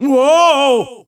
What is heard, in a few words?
Whoa